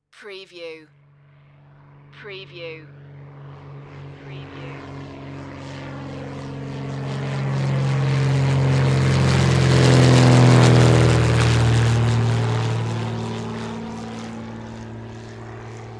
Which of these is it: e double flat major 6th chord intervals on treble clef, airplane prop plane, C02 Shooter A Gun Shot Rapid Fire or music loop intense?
airplane prop plane